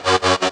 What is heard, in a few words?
RAZOR LOOP; Razor Looper Set